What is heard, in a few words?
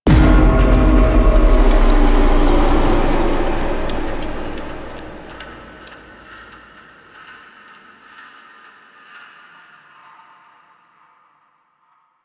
Death Start; Ambience; Drifter; Duviri; Warframe